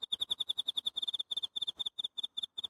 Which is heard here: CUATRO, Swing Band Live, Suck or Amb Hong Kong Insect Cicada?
Amb Hong Kong Insect Cicada